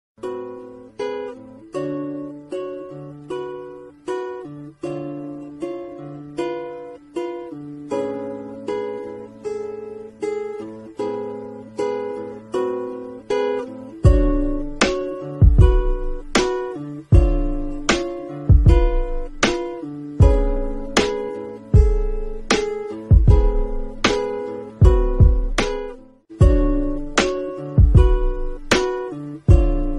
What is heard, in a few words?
lofi